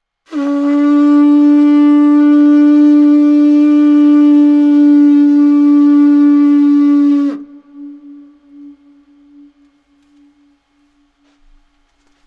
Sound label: Viking Horn In D